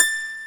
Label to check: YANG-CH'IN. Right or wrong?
Right